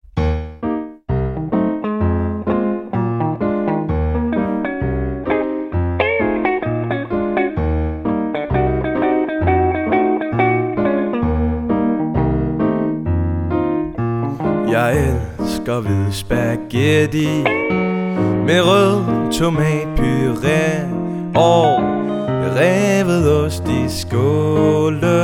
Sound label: Funk Punk